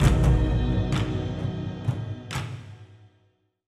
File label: Cosmic Reveal; HintsStarsLite